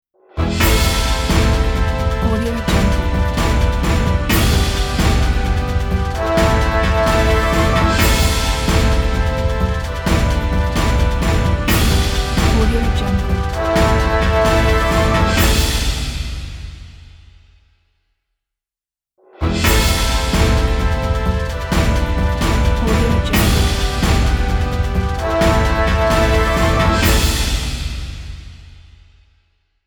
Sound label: epic orchestral trailer ident